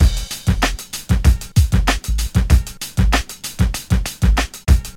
97 bpm rap drum loop sample D key, kick tuned to the D note